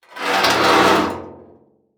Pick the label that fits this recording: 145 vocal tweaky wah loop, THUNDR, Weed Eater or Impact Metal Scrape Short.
Impact Metal Scrape Short